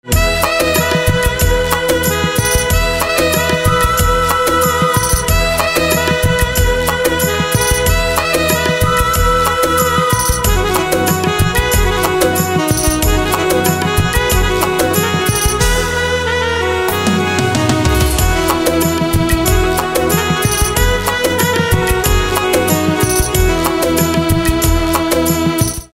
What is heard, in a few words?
Saxophone